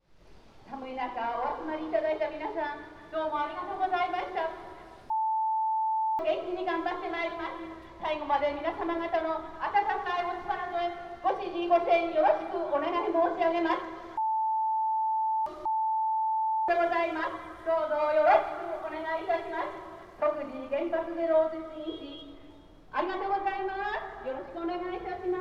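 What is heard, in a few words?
election, fukushima station; ekimae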